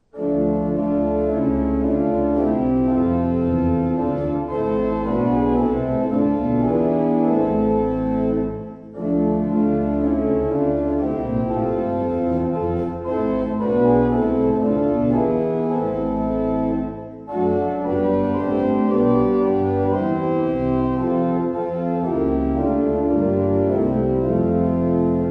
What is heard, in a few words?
Simon